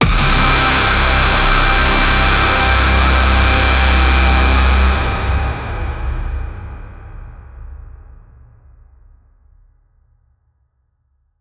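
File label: big concert deathcore band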